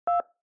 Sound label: Telephone DTMF 1